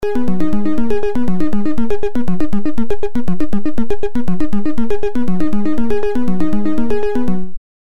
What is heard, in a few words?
patterned rhythmic flow